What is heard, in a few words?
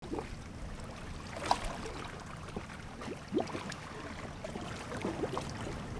river; Seer; GamigoNA